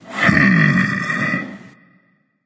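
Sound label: non verbal thinking, voice; cernunnos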